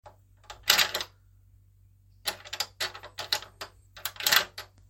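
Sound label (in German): Zu und aufschliessen einer Zimmertuer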